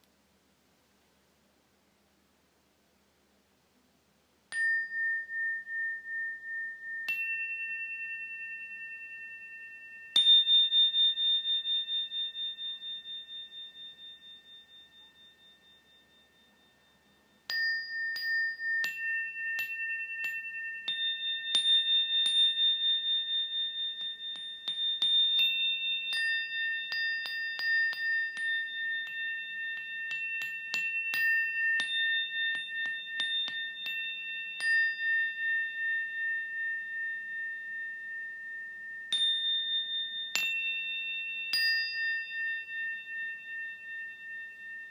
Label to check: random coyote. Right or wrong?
Wrong